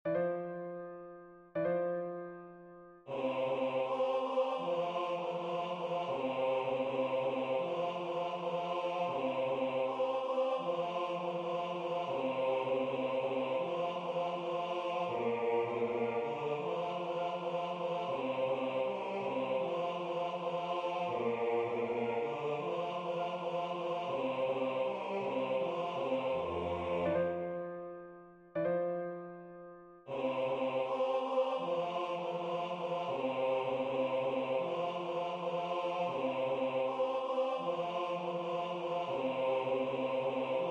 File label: bass